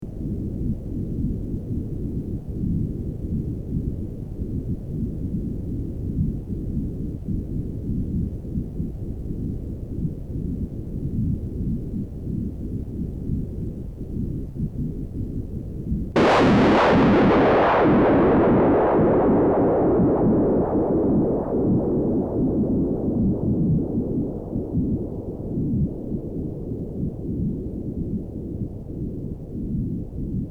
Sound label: thunder